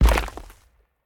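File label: large step; Smite; Cabrakan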